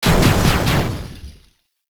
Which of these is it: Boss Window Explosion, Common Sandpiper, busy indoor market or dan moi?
Boss Window Explosion